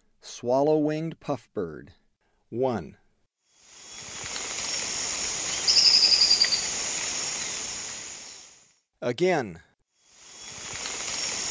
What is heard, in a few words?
Swallow-winged Puffbird; S. America; Eastern Lowlands